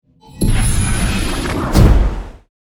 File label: Vampire vanish dark dissolve